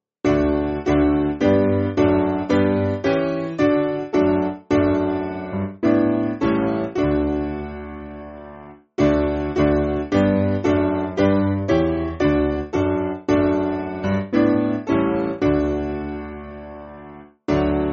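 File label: Piano, Music Backing Files for Churches; Nutfield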